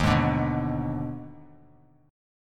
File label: Eb Major 7th Suspended 4th Sharp 5th Eb, Bass Standard; strummed; 11,11,12,4; EbM7sus4#5